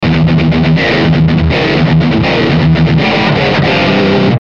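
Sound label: Musical Element, Drum Snare March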